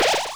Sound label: hit sound effect; undertale